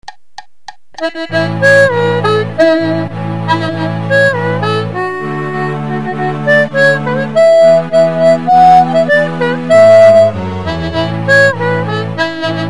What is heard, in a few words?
jingle